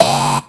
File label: Drone; rabbids multiverse; iOS, macOS, Mobile